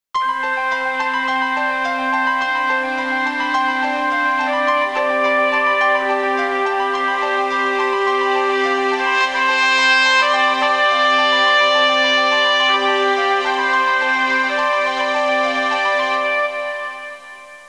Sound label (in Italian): musica sinfonica; symphonic